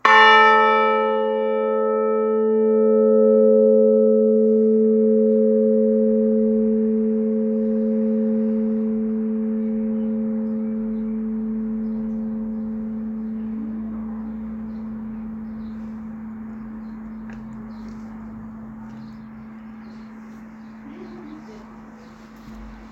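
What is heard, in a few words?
seven, bells; lower beeding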